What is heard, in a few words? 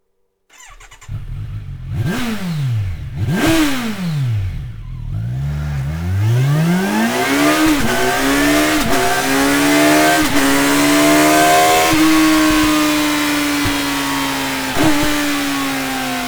Sound Slip-On